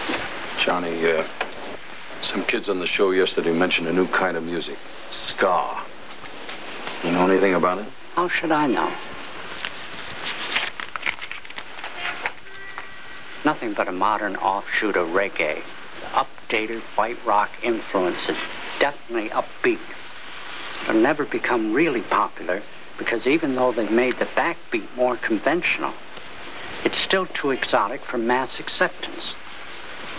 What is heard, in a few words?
ska